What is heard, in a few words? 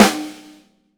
MAPLE SNARE